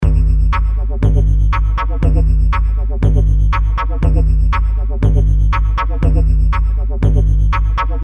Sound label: Weird Lab Loop